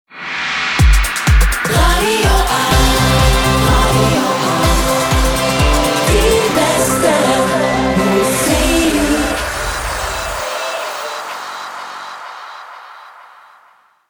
Transition Fast Dance to Soft aktuell; Radio 8

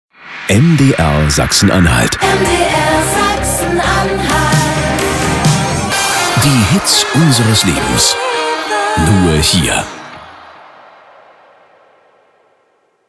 Transition Beispiel hot to easy

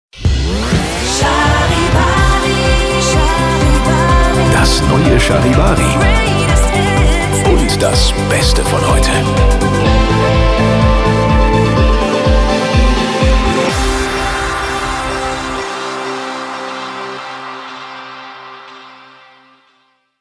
Show Opener Standard kurz; Charivari

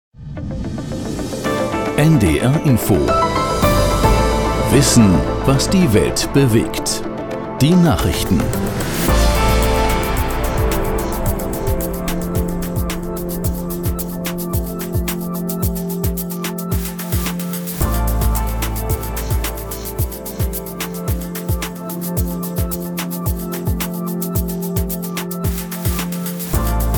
Nachrichten voll mit Schlagzeilenbett; NDR Info

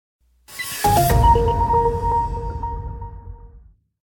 Sound Logo Info Trenner; MDR S AN

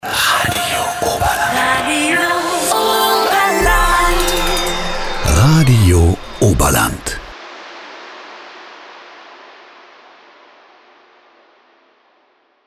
Stinger Wochenende Promobett Vox; RADIO OBERLAND

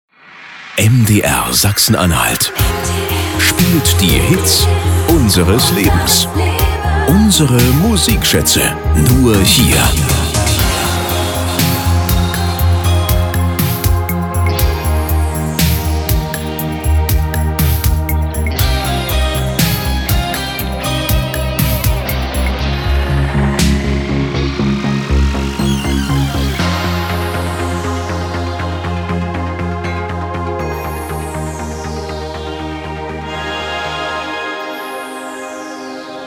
Bumper und Musikbett 80er; Musikschätze

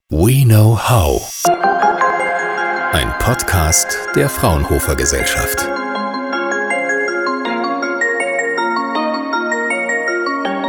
Opener und Musikbett slow; WeKnowHow

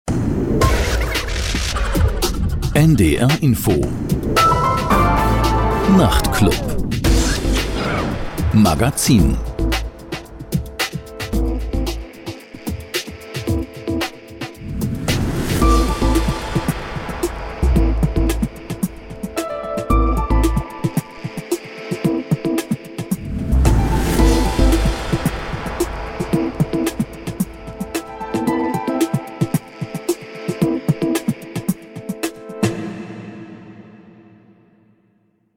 Magazin Opener mit Musikbett; NDR Info Nachtclub

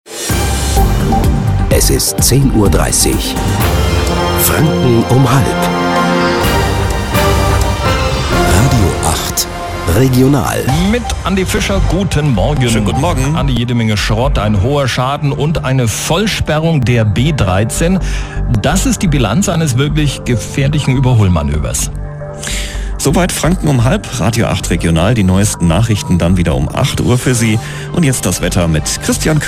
Programmbeispiel; RADIO 8

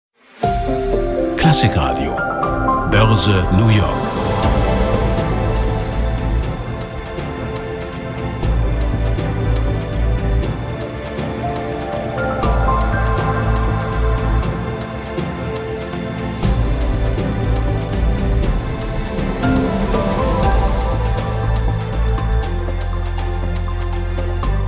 Bumper und Musikbett Boerse; New York

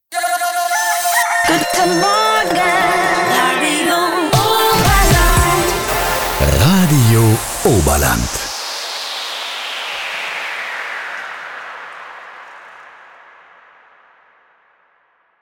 Stinger Morgen Promobett Vox; RADIO OBERLAND

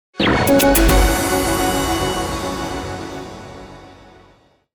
News Stinger bzw. Trenner; RADIO PRIMAVERA

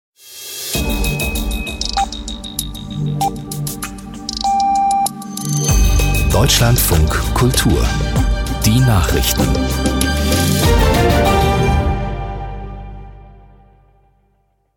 Nachrichten Opener; DLFK

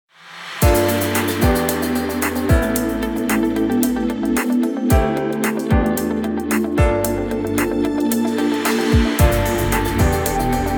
Intro ohne Sound Logo; NDR Info; Fillup 2021

